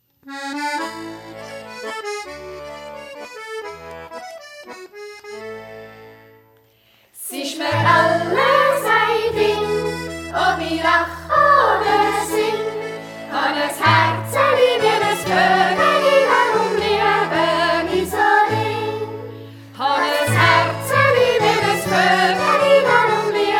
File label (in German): jodel